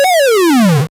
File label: 8bit arcade game sound